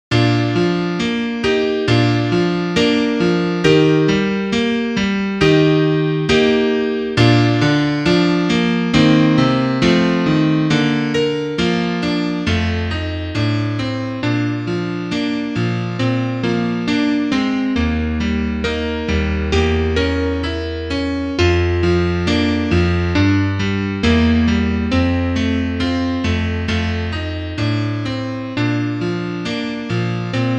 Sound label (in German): Klavier Solo, trompete